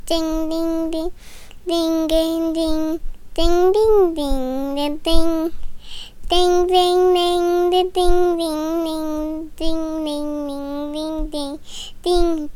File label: Jingle Bells Ding